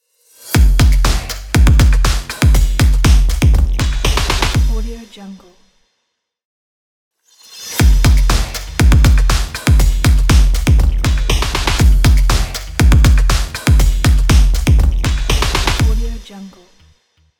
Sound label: breakbeat drum and percussion logo